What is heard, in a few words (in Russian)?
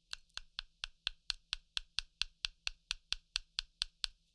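тихий стук о что то твердое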